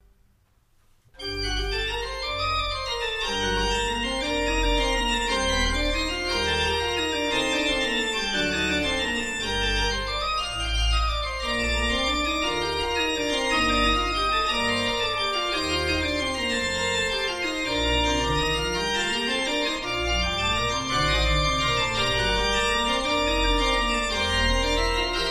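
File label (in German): Godefroy Hartmann 17 II P, pfeifenorgeln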